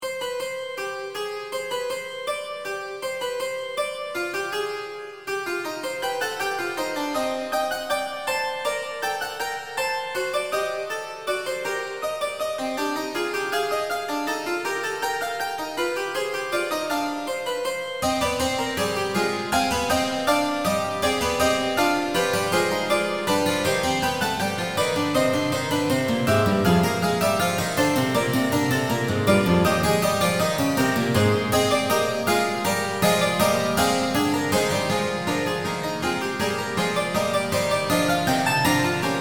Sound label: fuga Cm